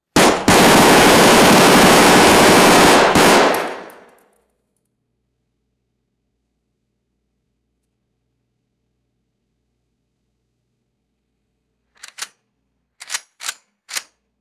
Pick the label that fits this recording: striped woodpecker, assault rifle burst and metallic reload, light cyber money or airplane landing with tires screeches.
assault rifle burst and metallic reload